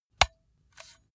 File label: metal pole